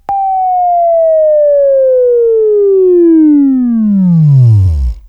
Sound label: Buzz, Left; Sweeping Training